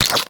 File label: Punch Life Steal; Warframe; Infested; Kick Punch